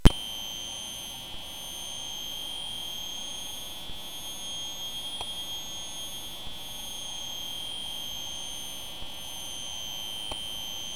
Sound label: sid MOS6581R3; Kromazone